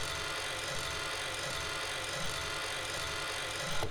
dial forward

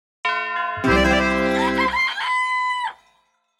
Good Morning Rooster; Atelier Rorona Arland no Renkinjutsushi

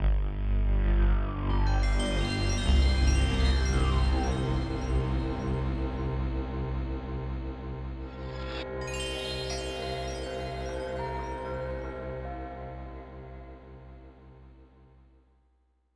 (Sfx) Startup; marios mystery meat; snes

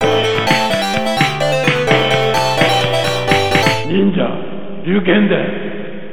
Round Clear; Shadow Warriors; Ninja Gaiden; Ninja Ryukenden; arcade

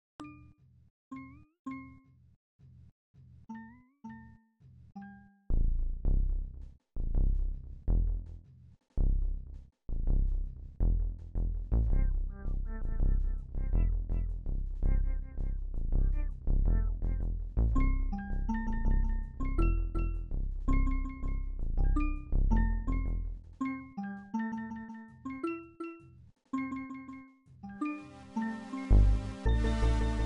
Retro; Shapes 2

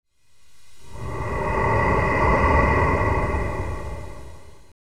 Slomo Breath; Alien Swarm Reactive Drop; Windows gamerip 2017

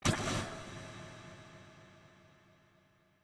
Music; Sifu

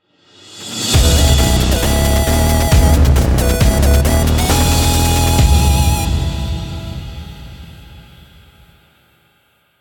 Stage Clear Fanfare; Bionic Commando Rearmed 2

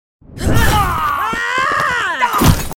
Witch Blood Suck; Goddess of Trampling

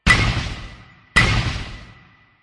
Forge; the great ottomans strategy battle for throne android; SFX Equip Forge

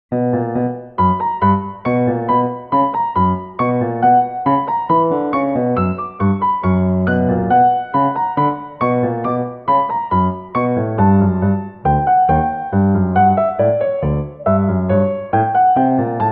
Bayou Piano; MySims Agents

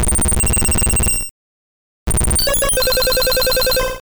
Rock Slide; Pokemon; Gen 1; attack moves; RBY

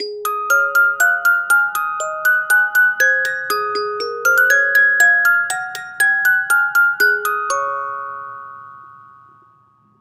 Music Box; Assassin's Creed Syndicate

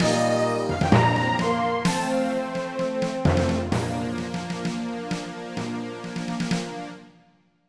Victory; Ultima Underworld

